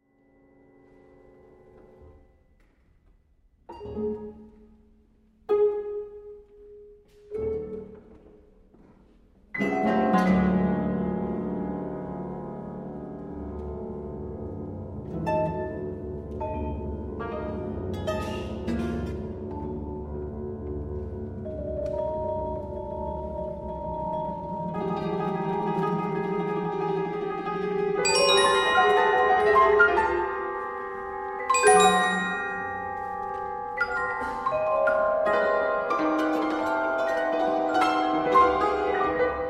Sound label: cimbalom